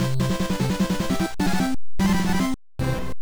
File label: VS Victory; bomberman ii; nes